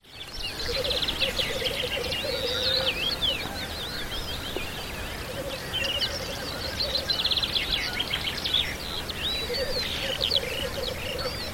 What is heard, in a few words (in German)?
birkhuhn